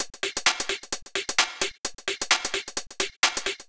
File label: Beat 130BPM (Drums No Kick), techno; techno drum fx